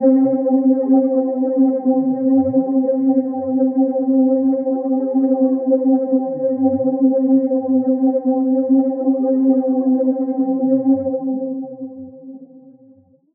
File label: PAD Glide (C), Pedal One Shots; Infinity; Cymatics; ANALOG EVOLUTION Beta